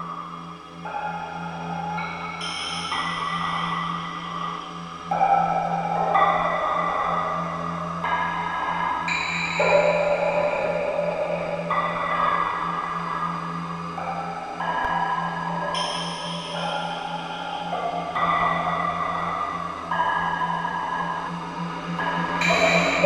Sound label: Frozen Fountain Ambience; sonic and the fallen star